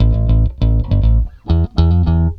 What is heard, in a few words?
BASS, ROCK POP; MAGIX